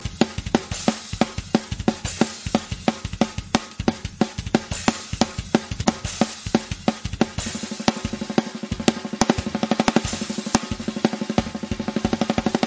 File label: Punk Drummer